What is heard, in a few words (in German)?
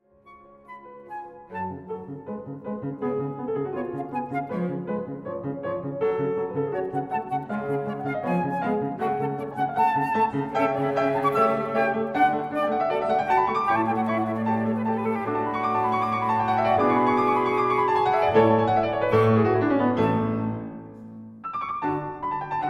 Haydn 1. Satz D-Dur